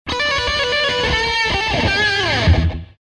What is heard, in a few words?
guitar